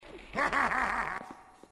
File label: elite laugh